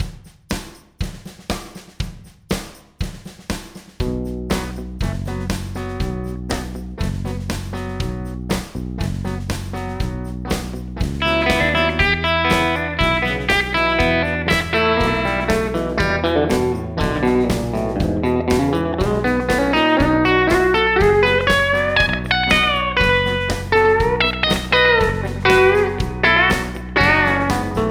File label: short country guitar break; backing tracks; solo break